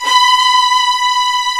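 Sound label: violins, fff; Roland - String Master Series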